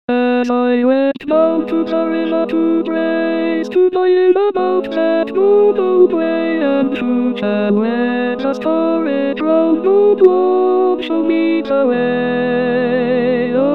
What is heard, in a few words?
Altos; Down to the River to Pray